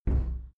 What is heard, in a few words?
cupboard close soft